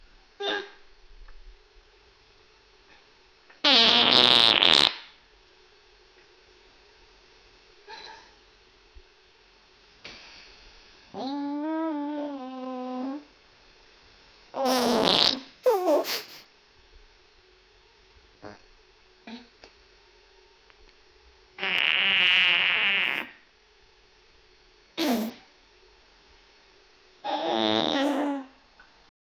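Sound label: an old furious lady farting, obnoxious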